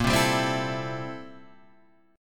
A Minor 7th Bb, Am7/Bb chord; Am7/Bb strummed; JGuitar

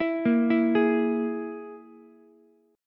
E Major Flat 5th, Ukulele; arpeggiated; JGuitar

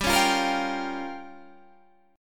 G Minor 7th Flat 5th down Guitar Standard, chord; Gm7b5 strummed; JGuitar

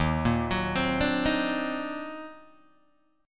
Eb Minor Major 13th, Guitar, DADGAD; EbmM13; arpeggiated; JGuitar

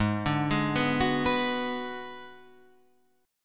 Ab Minor 7th Flat 5th, Guitar, Half Step Down

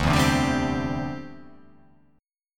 D 7th Suspended 2nd Suspended 4th D

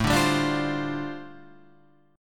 G 7th Suspended 2nd Suspended 4th A

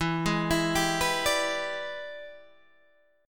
E 7th Sharp 9th Guitar Open G, chord

